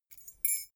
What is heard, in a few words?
key ring small fly tinkle